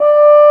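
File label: French Horn mf D2; Digital Sound Factory Virtuoso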